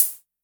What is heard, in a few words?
Hi Hats Open; LIMAT Div Dept